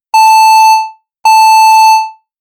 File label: notifications